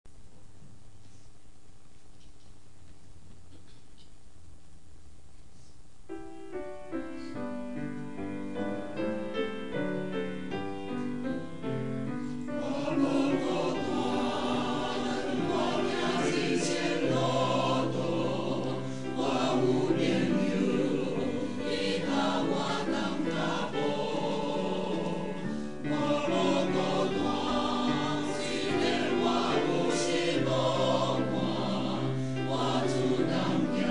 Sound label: Choir; taiwanese